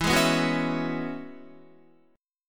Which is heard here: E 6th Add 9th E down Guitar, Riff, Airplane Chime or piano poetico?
E 6th Add 9th E down Guitar